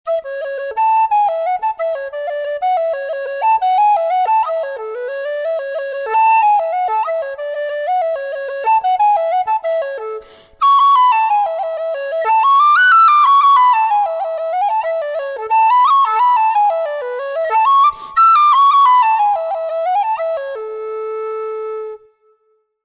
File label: Susato Dublin in A